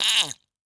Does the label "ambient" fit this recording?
No